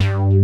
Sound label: FUNKY LD BASS; Pro-Rec - Synthsonic; BASSES